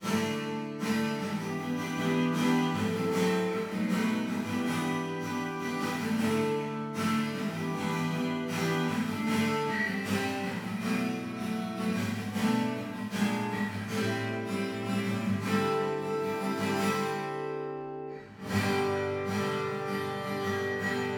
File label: Ac Guitar